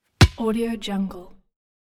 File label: soccer ball bounces off asphalt